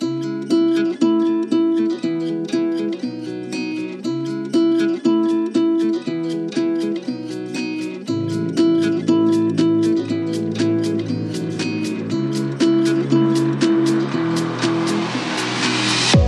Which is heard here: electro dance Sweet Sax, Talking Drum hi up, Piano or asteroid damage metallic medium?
electro dance Sweet Sax